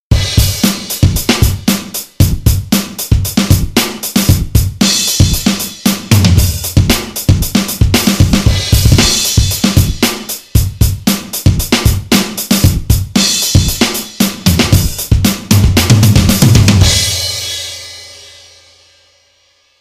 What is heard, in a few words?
room drum